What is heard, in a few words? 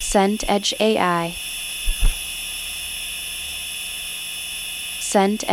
gas hob flame